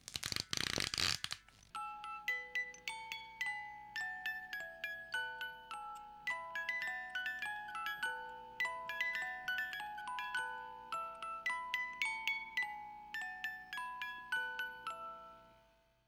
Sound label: Twinkle